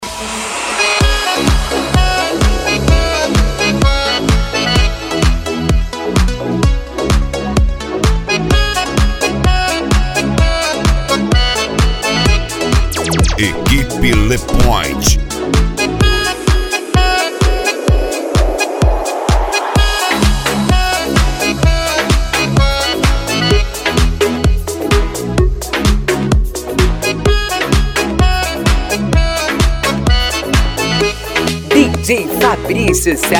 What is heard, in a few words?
deep house